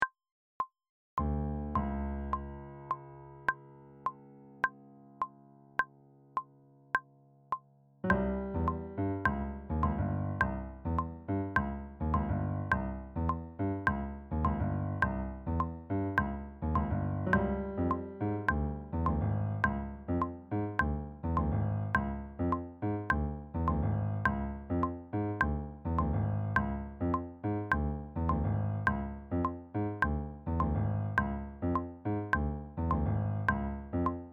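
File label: cuban at tempo horn and tuba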